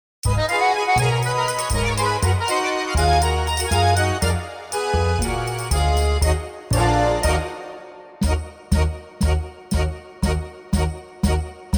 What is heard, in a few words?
us tango